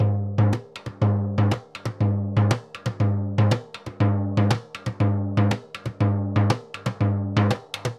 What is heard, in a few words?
Surdo Samba 120, Drums & Percussion; 120 bpm; Best Service; Festa Latina VOL-1